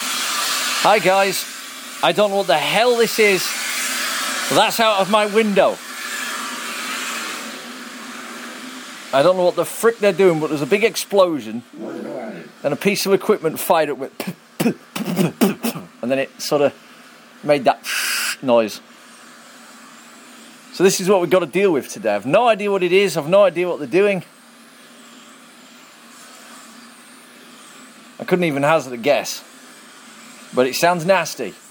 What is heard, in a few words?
strange and very loud noises outside my window